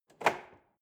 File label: drawer large lock open